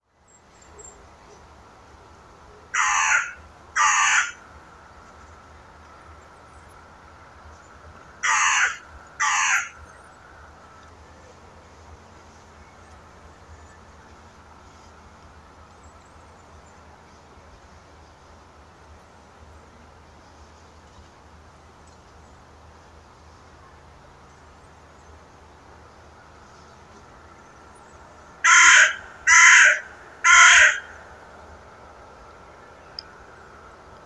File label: Corvus corone; SETE